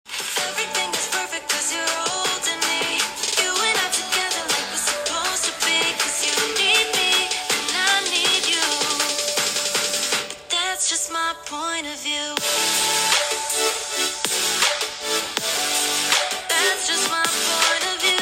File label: Speaker; GalaxyZFlip5